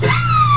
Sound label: Whale; Whale Critical Stop